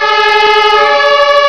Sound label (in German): Pressluft; Freiwillige Feuerwehr Oberschneitbach e. V.